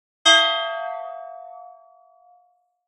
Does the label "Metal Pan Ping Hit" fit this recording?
Yes